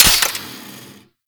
Spark Rifle Mech; Warframe; Grineer

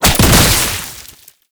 Tripwire Explosion; Enemies; Gameplay; Boss; Warframe; Oraxia

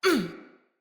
Pain Female; Battle; Envoy

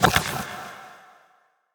Memory Go Board Player Place; Warframe; Sacrifice

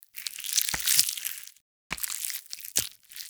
Volatile Runner Taunt Flesh; Warframe; Proto Infested; Abilities

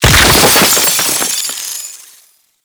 Sentient Column Destruction; Warframe; Tau; Destructible Dynamic

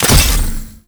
Machete Finisher Slice; Sentient; Warframe; The New War; Kahl; Weapons

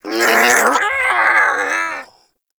Armadillo Vocal Death; Warframe; Characters; Hunting Critters

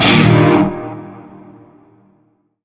Shawzin Pent Min Chord; Warframe; Narmer Shawzin; Chords

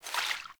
Vial Foley; Warframe; Warframes; Alchemist

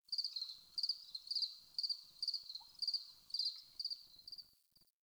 Tree Critters; Warframe; Duviri; Ambience

